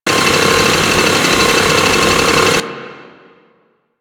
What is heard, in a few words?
Saw Blade Launcher Fully Charged Loop; Warframe